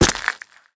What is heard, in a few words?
foot glass stone; footsteps